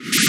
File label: noise fx; Masterbits; Switch FX